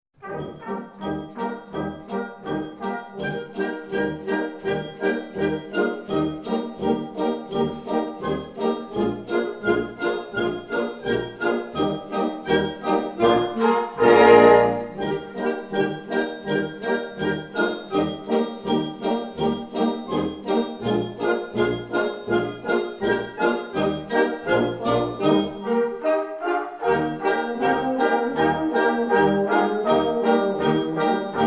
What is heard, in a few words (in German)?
Amboss Polka